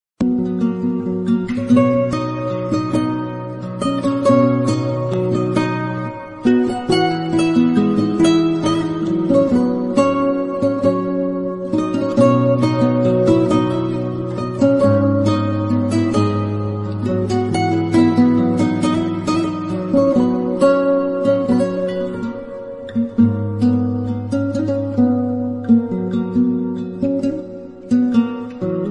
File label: instrumental bgm love music